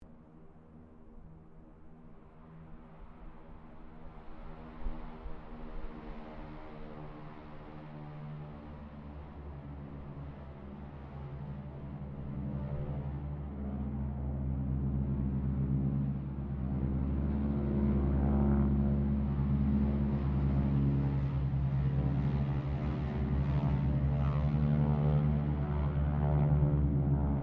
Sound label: AIRCRAFT HARVARD SQUADRON 3 PASS DISTANT; Mixed aircraft squadrons; Airplanes; Vehicles